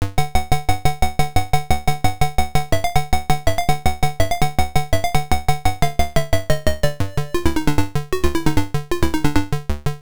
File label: Challenging Round (Bonus BGM); [Played once]; uncle poo arcade gamerip 1983